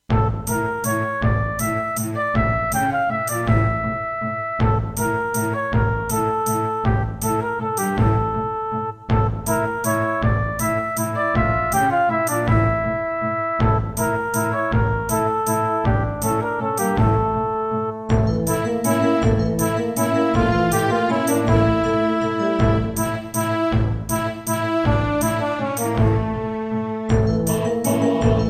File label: Roland SD-50 GSMODE